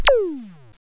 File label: Arrow